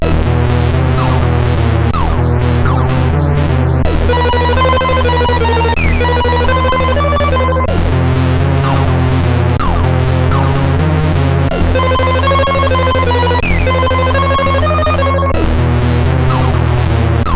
Nice Loop, CSG8580R5

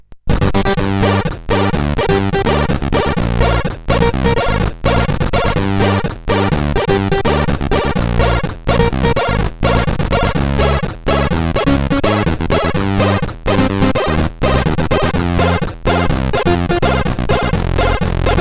March, CSG8580R5; sid

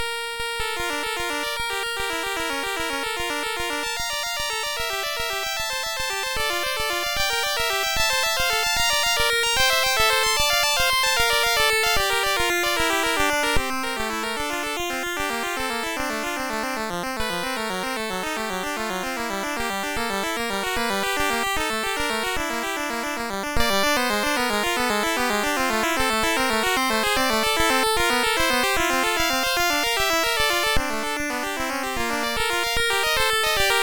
Prelude VI, MOS6581R3

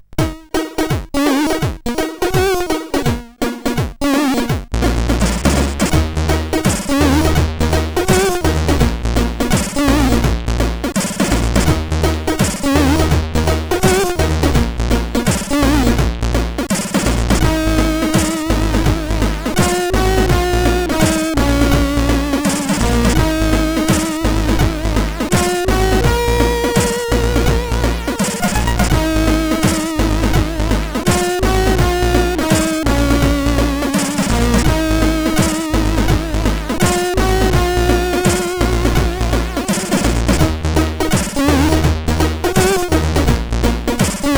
Future Reggae, sid, CSG8580R5

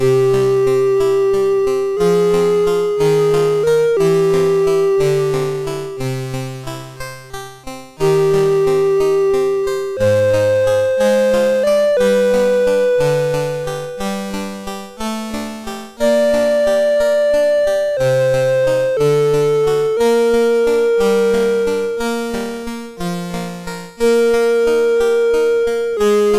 Synth Sample; MOS6581R2

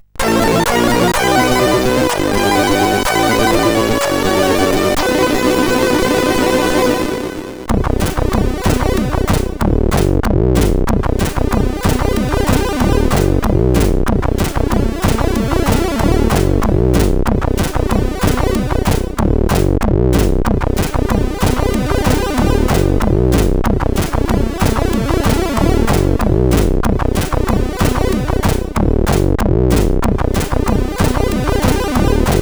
intro, CSG8580R5; Smash 13

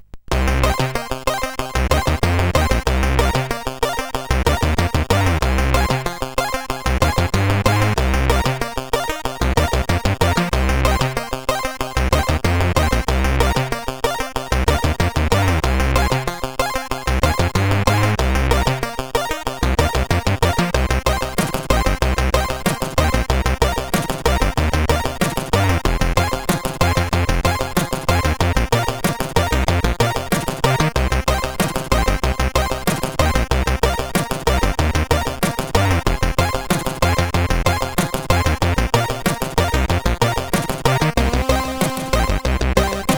Reggae Trip, CSG8580R5

